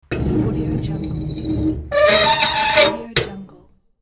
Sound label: screeching metal latch